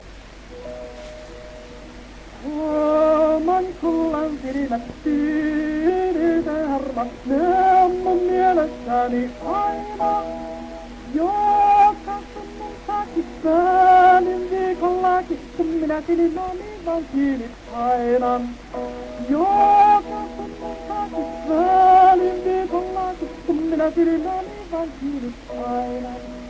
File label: Gramophone